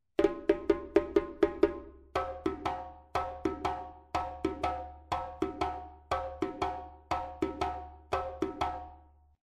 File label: Djaa, djembe